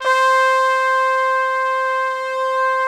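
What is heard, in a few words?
BRASS C4 right, ORCH BRASS; Greytsounds VOL-1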